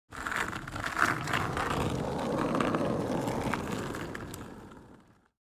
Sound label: Skateboard slow start push off